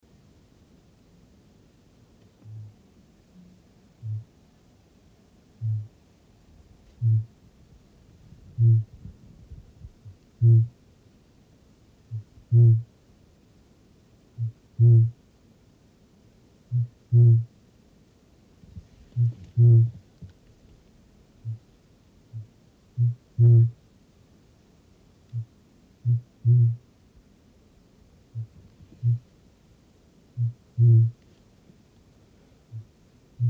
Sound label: kakapo; conservation, native animals, birds, bird song